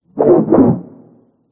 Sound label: round score slide in rows